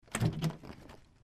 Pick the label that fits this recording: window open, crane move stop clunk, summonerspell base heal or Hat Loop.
window open